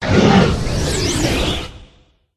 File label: werewolf death; boss